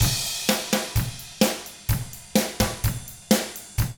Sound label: 127 SURF ROCK